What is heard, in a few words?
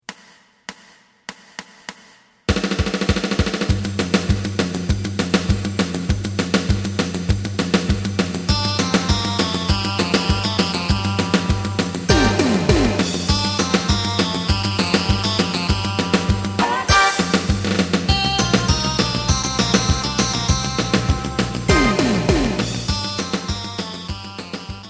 bass